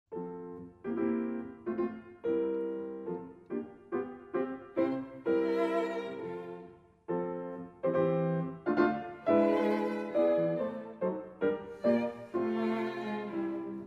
piano trio; marschner

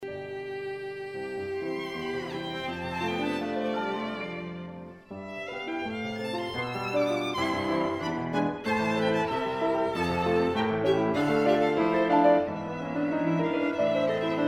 trio; ireland; phantasie trio

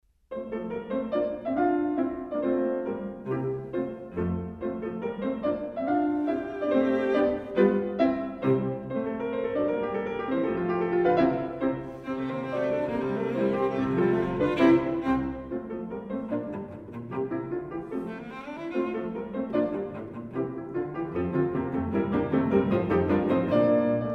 cello sonata; franck

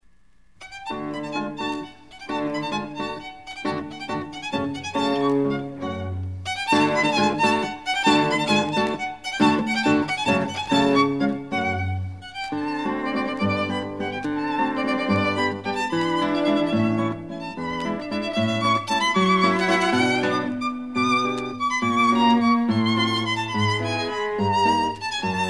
guitar trio

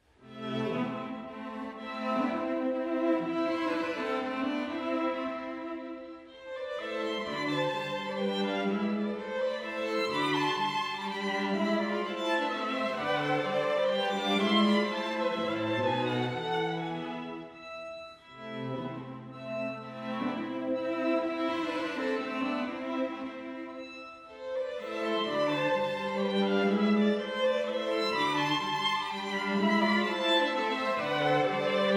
mazurka; sokolov

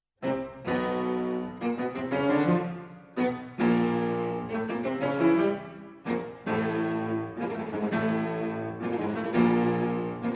piano trio; marschner

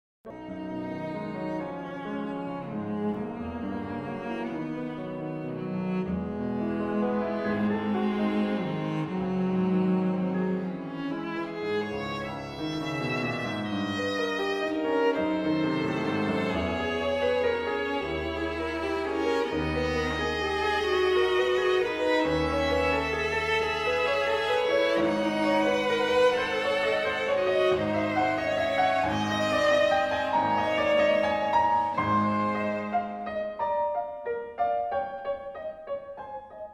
trio; goetz

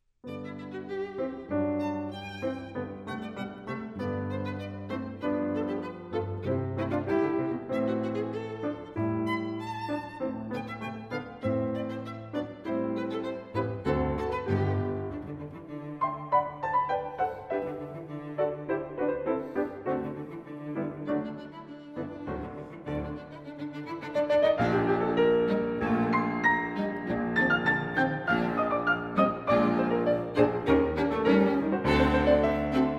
piano trio; dubois

